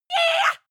female war cry short

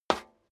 step high heels metal rattle slide short

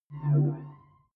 sci-fi visor clean; krieg-waffen; soundpool

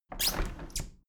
window handle plastic squeak open regular; objekte; fenster

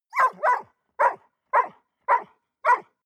dog bark sad; natur-tiere; hunde

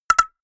notification signal

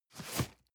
cardboard box medium slide short hard; objekte

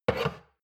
shot glass place table slide hard; berufe; gastronomie; soundpool

